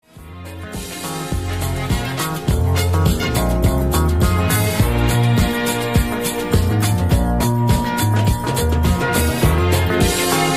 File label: Instrumental